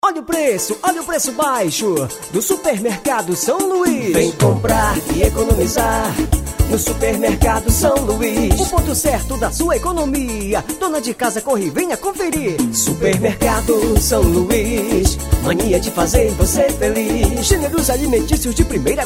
jingle supermercado; sao luiz

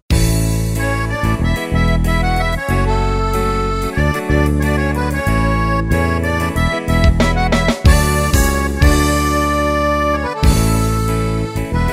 forro introducao

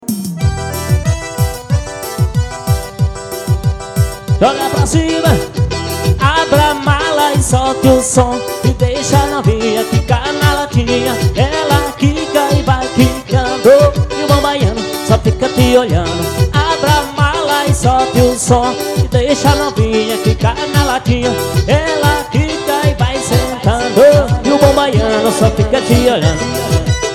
forro ao vivo

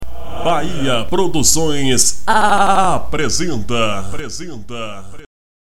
abertura

